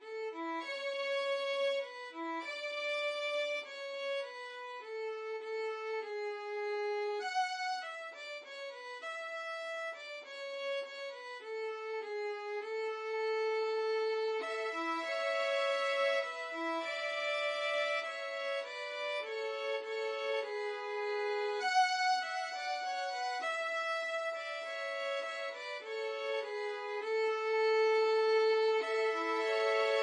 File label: violin trio; dona nobis pacem